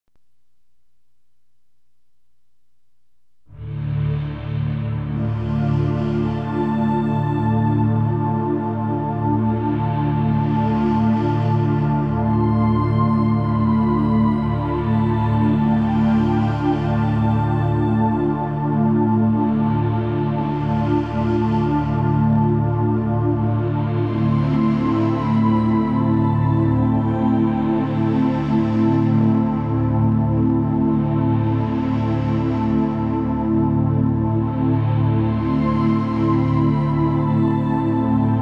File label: Just Strings